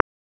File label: Rimshot - Rim, Wiard 300-Series Modular